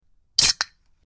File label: Soda can fizz